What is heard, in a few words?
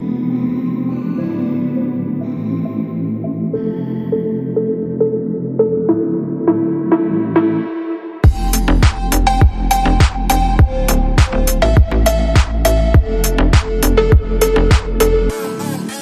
Phonky Tonk Phonk